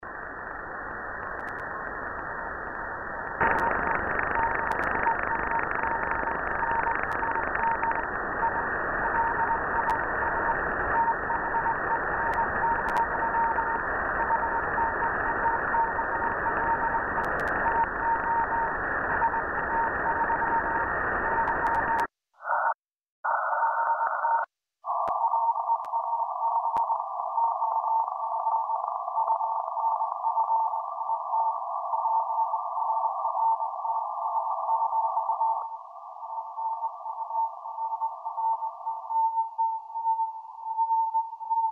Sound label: 9100 cw; Haarp